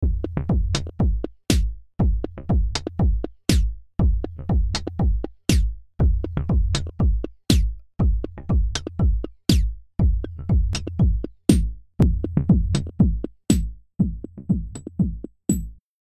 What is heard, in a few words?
phaser